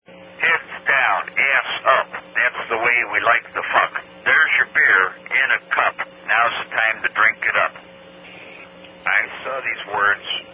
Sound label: Down-Down Chant; Heads Down Ass Up